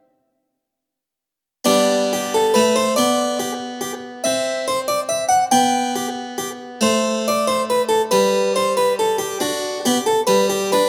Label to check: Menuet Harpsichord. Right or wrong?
Right